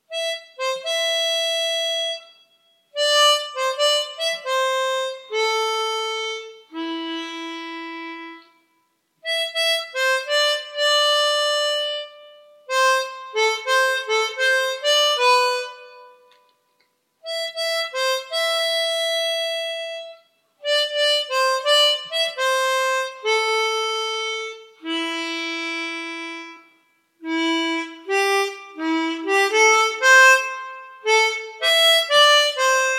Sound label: harmonica